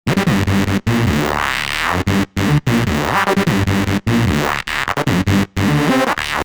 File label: notch lead